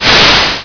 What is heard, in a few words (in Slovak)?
Zmiznutie, Vesmir; Revelation Sight and Sound SK